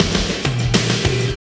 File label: Fills, Roland; Sound & Vision - Gigapack I; Perc